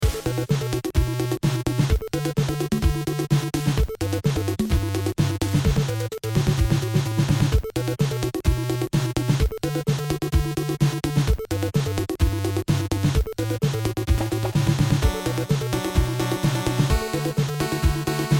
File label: beat